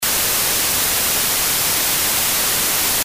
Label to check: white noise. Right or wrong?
Right